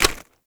Bone Effigy Impact Hit; Gameplay; Warframe; Tau; Destructible Dynamic

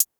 Hip Hop Pack

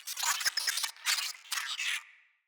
Space Drone Attack; Corpus

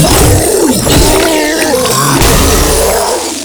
Infested Ancient Toxic Tentacle Swing Flesh

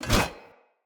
Corrupted Battle Dog Paw Swipe; Combat

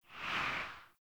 Pre Landing Wind Gust, Falling Wind; Player

